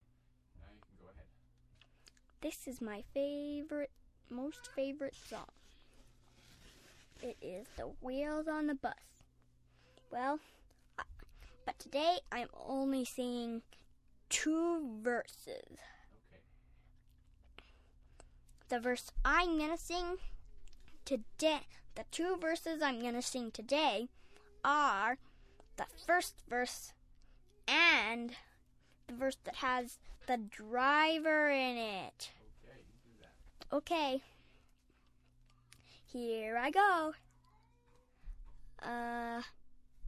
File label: singing